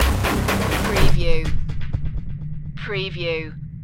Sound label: man machine loop